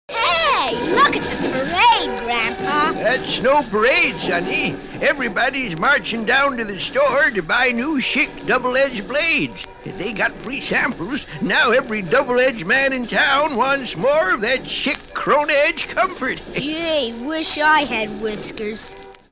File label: schick, razor